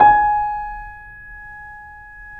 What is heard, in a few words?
YC7 piano, pp; Roland L-CD701; pp YC7 St